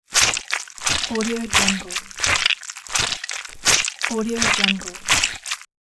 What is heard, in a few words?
fast walking footsteps in squishy mud; قدم های سریع در گل و لا; human sounds